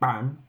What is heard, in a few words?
drum hit whistle